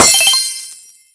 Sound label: Coin Big Pickup Medium